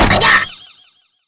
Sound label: pain; awesomenauts